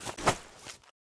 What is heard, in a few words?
knife kick